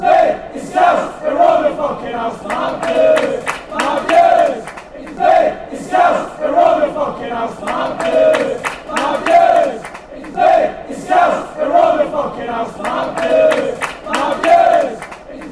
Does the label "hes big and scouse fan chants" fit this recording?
Yes